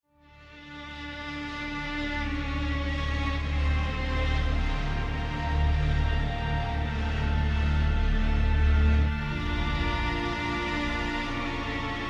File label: Combat Stinger; state of decay lifeline